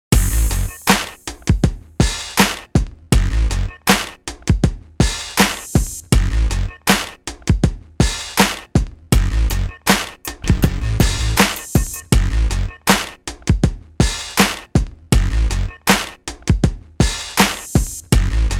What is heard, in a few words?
swing mini mix bpm 80